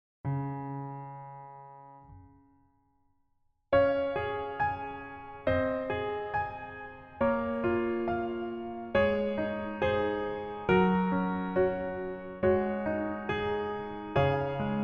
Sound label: Piano